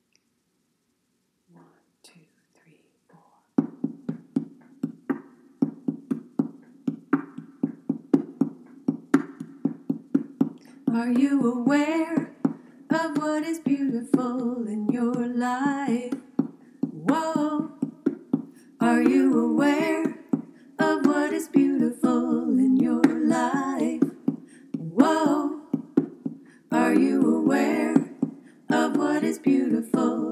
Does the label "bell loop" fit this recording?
No